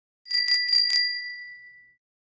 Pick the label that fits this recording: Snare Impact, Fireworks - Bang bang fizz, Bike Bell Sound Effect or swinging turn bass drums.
Bike Bell Sound Effect